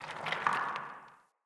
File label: Cave Rock; Caves; Glades Of Andurin